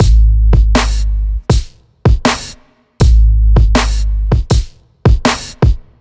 Funk Kit; Ударные и барабаны (Downbeat): Funk Kit B; 80 BPM; Downtempo; Drums; даунбит

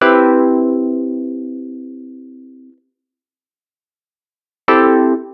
E Wurli, keys

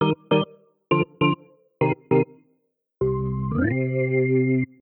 Blues Organ, funk blues organ; сэмпл клавишных

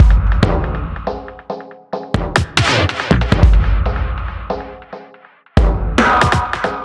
Astro Base C, 140 bpm

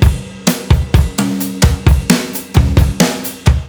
Brush D, ударные и барабаны latin; латино

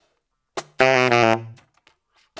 funk solo C, духовые

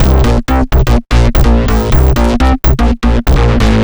Fuzzer, big beat

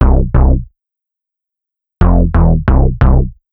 rap

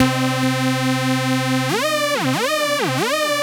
Alarm Lead

